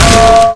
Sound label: melee frying pan; weapons; Left 4 Dead 2